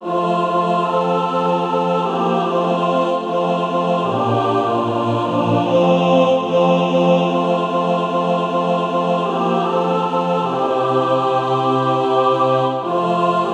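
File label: mixed, SATB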